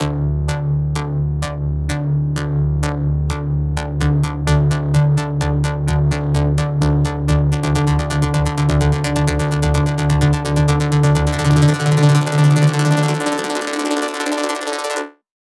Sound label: 128BPM bass D, Melody Fills; Knorzbass; 8Bars; Vengeance Samples